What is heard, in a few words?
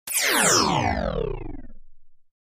Descend High Frequency Electronic Descend with Shimmer, Tremolo